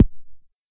switch; server-nalc; mesecons

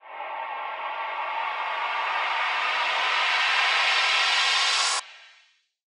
woosh; warps

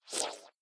returnmirror set; server-nalc

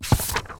scroll

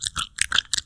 gummy chew; dfcaverns; farming

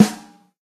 noteblock snare; mesecons; nalc server

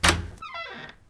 door open; homedecor modpack

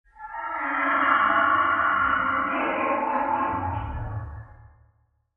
horse neigh; dfcaverns; ambience

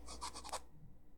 write; cartographer

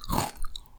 soft chew; farming; dfcaverns

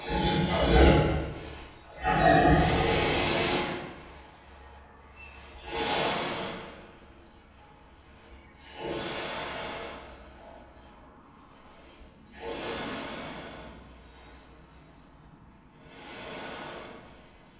pig grunting grumbling; dfcaverns; df ambience

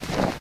snow footstep; nalc game

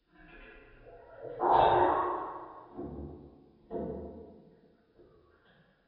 bird budgie song; dfcaverns; df ambience

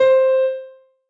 noteblock c2; mesecons

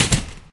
glass door close; server-nalc; minetestforfun game; doors

